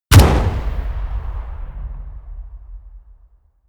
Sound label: burst